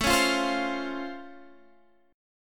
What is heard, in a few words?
C sharp Augmented Major 7th A, chord